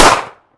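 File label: ringing single shot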